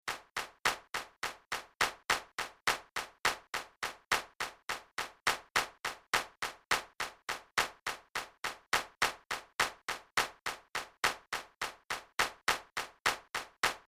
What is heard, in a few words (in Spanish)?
Flamenco Buleria 70